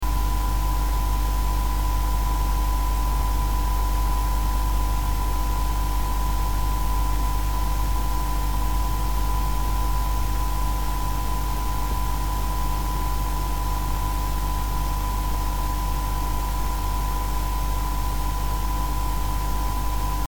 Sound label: AX760 noise; really annoying harmonics; psu